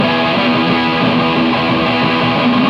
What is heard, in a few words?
Guitars; ACID Music